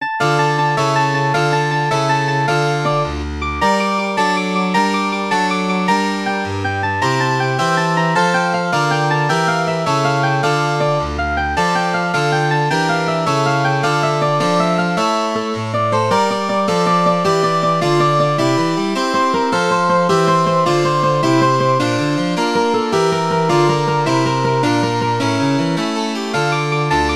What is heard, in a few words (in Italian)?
RE MINORE Giga partitura cello, mandolin